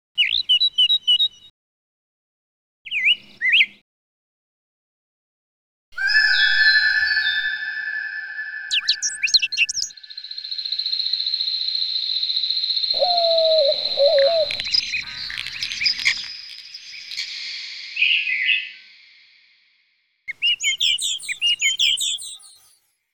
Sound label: birdsong